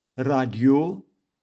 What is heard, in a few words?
radio